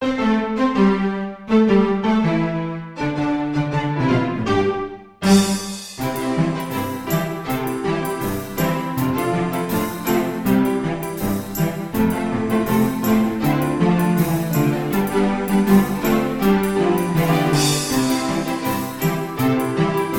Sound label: Ragtime